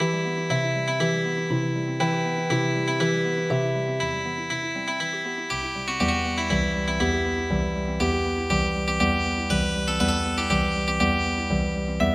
Trauermarsch Healing Harp

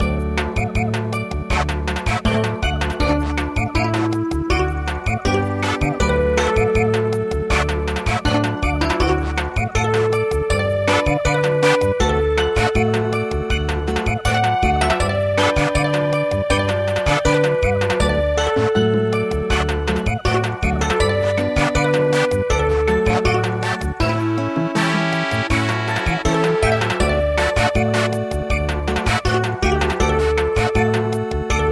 Samba, midi; SHIRAKUMO NABIKU

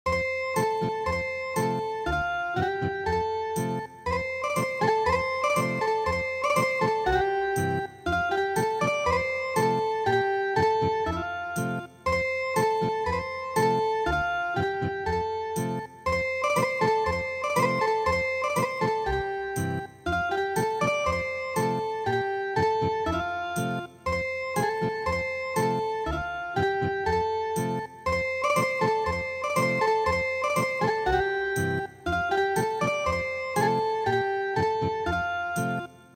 Ethnic Guitar, traditional

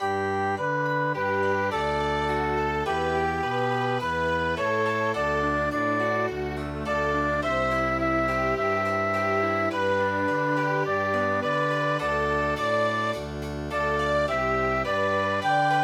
Chamber Music; O mistress mine

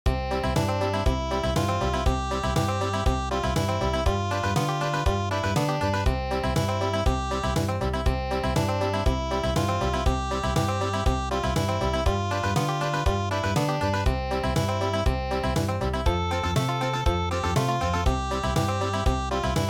Polka Country; midi; traditional

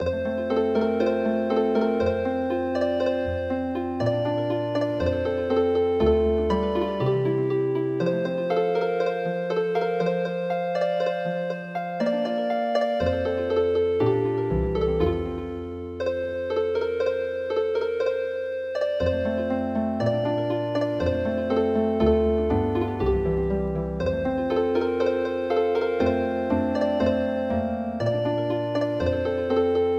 Healing Harp

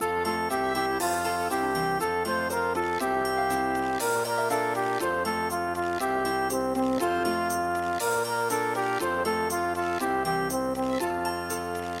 nursery rhyme

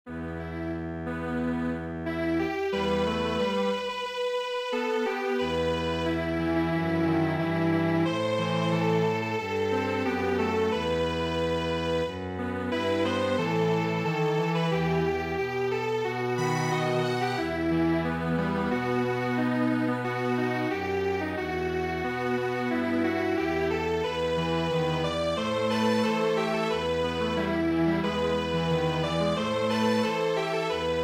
Strings Quartet

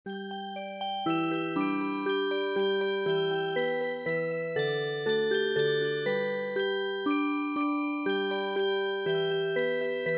Dance Song Music Box, traditional; midi

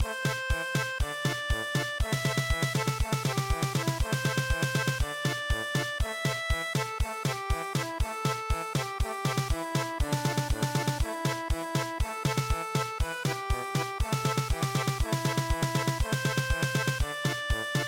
German Polka

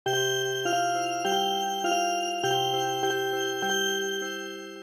Music Box

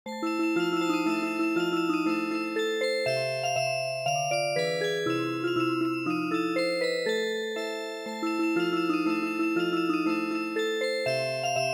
Music Box; daichi ni hana ga saite iru

